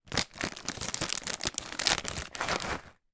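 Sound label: food popcorn kernels pack open shake; zapsplat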